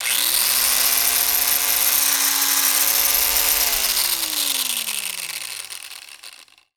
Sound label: Roto Saw; Tools